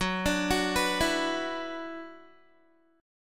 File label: F sharp 7th Suspended 4th Sharp 5th, Guitar